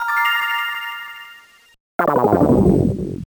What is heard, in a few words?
Auction Success